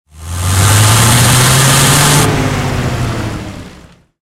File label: m1 engine load, tanks; War Thunder